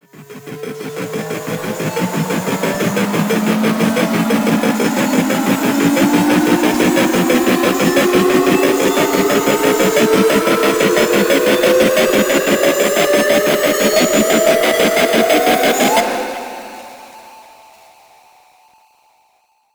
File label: Riser, Effective Trailer Risers; Federico Soler Fernandez